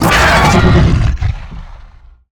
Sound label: Staircase Tell; Evolve; Behemoth